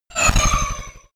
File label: sci-fi laser shot disrupt; krieg-waffen; soundpool